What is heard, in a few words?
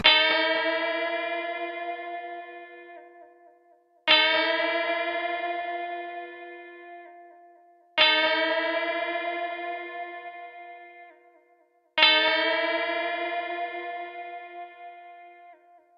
Guitar; Guitar Cat; Plasma Island